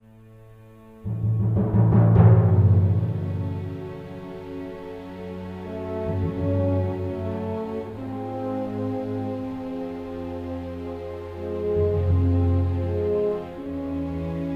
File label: fail menu; Hidden Dangerous 2